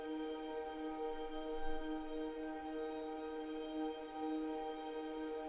main Game Chord Eb; netent

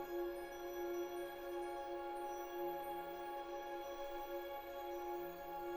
main Game Chord Db; netent

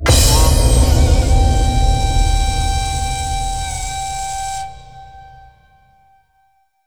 big win start; netent

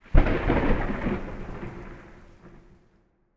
lightning left; netent

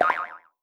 Attention Wild Stacked; secretofthestones

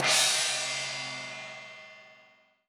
respin Outro; netent

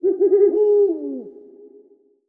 owl

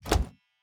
reel bounce; spinsane; netent

